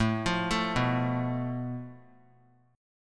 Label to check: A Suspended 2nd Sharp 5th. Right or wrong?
Right